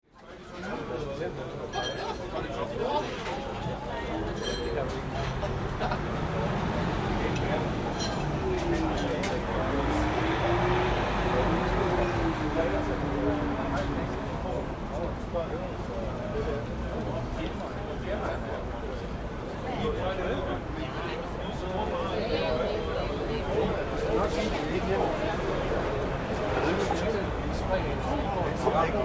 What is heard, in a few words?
Ambience Europe Cafe Streets Denmark; Restaurants (Sound Effects:Backgrounds)